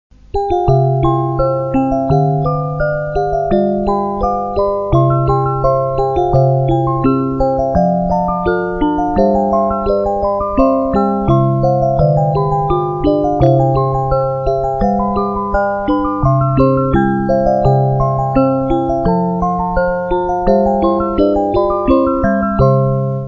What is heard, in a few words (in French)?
flute enchantee